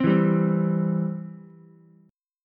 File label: C Major, C, down, Ukulele; strummed; chord; JGuitar